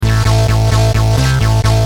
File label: Driven, Bass; Industrial